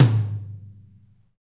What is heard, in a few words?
ROKTM MID; Drums In Your FACE!